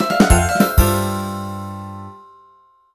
JGL DIALOG; Girls RPG Cinderellife; 3DS

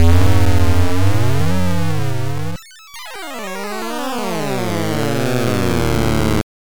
Wormhole Teleportation; Solar Jetman; NES

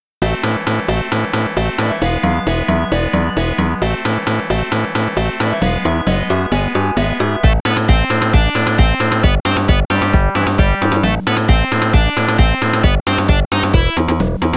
Boss, genesis; Oil Ocean Zone

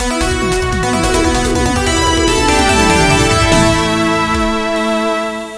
victory jingle; Gun Crazy

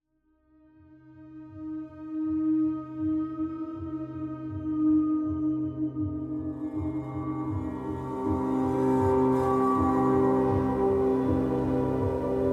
Exploration Minimal; Exploration General

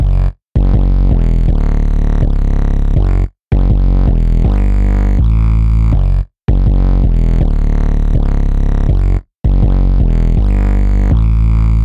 bass

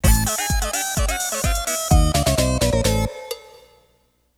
Story Mode Defeat Tune

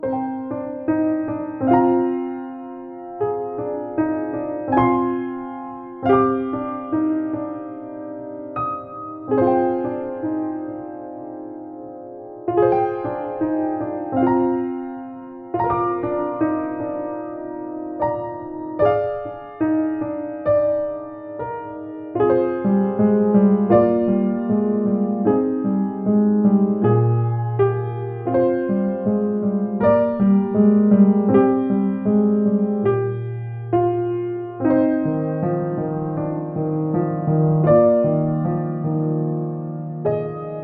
Puzzle Theme Piano; The Girl and the Robot

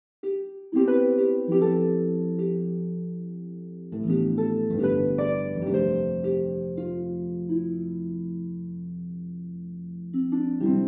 Lullaby Loop

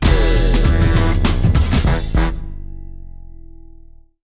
Levelup Generic Sting; block n load

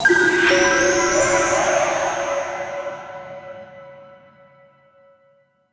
SOUL JINGLE; neverawake

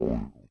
Building

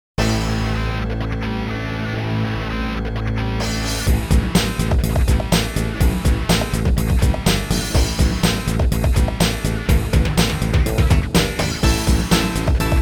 Goal Rush

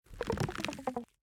Retract; Forest SFX

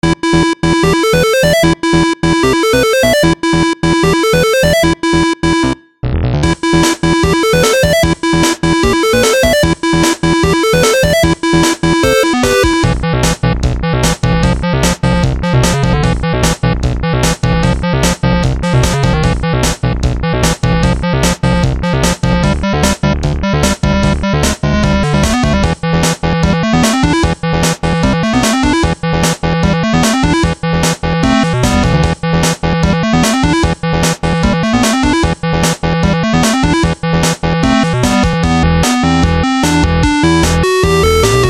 basse Boss; super stone legacy

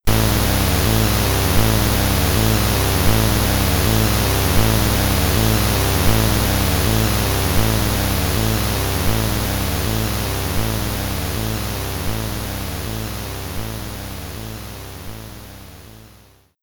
Hit by Venom; Forbidden Forest; Commodore 64

